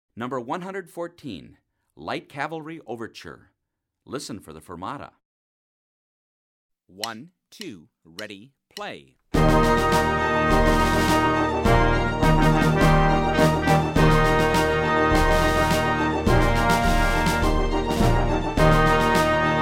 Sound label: light cavalry overture, band